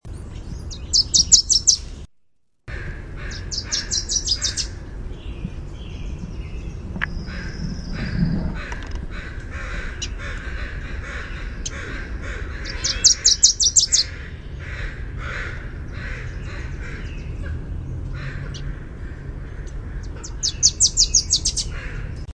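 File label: Wilson's Warbler song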